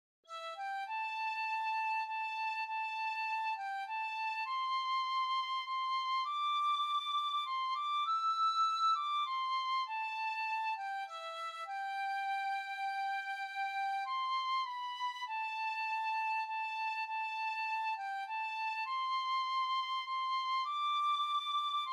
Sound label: tin whistle